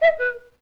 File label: LoFi Cuckoo, Vintage Cartoon Foley